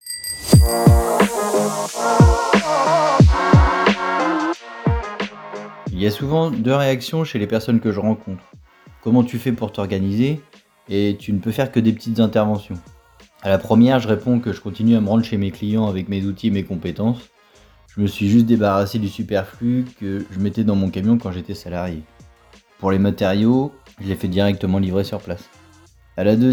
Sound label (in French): jingle